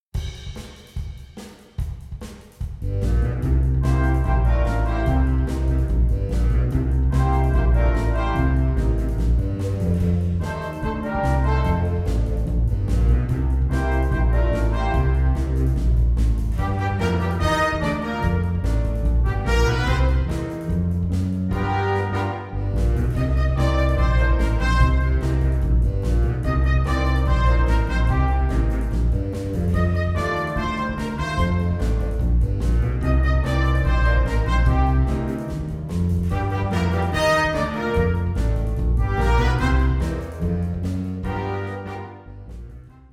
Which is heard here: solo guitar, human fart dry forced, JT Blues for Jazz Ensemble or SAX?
JT Blues for Jazz Ensemble